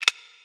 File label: Reset; IntegrityTool